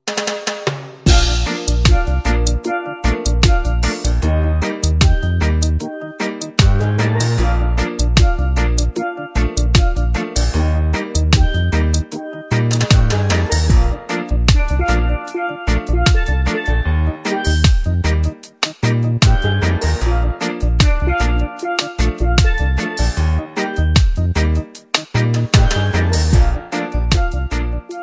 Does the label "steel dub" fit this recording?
Yes